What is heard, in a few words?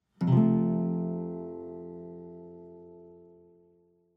chord